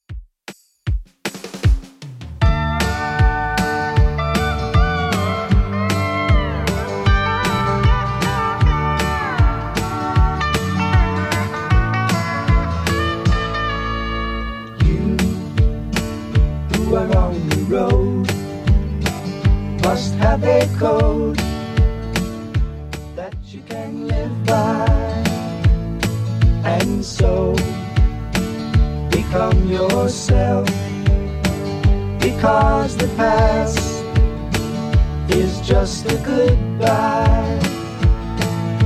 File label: 70s Redrum 155bpm